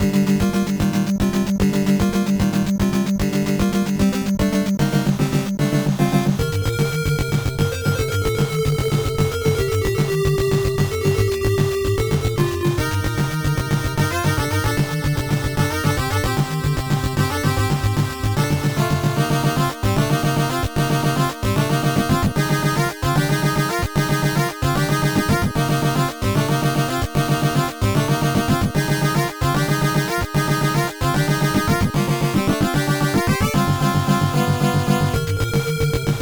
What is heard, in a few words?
Stage BGM; final mission 1990 nes gamerip; Mono~Stereo